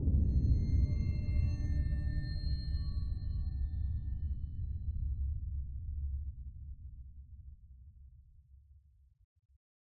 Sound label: Meadow Boom Teleport Tail; gamerip; Hellblade Senua's Sacrifice